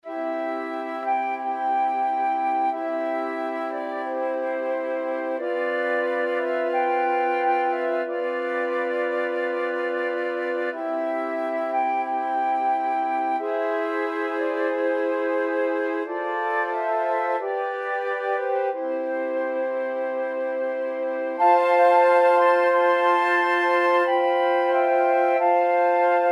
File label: dvorak largo, flute quartet